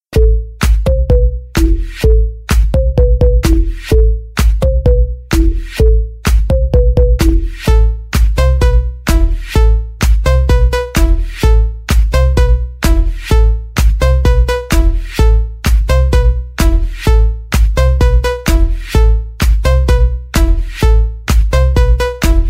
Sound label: base piano; mega funk; pack de base de mega funk